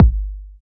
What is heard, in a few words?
short reverb kick drum single hit g key